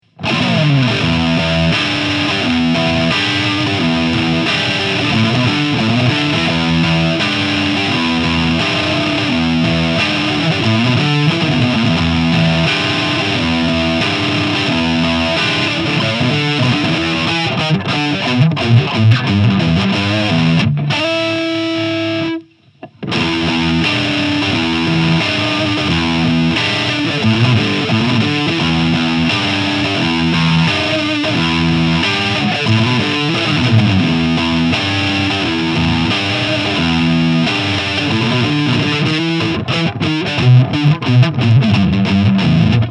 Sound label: Classic 3 Way Switch Neck To Bridge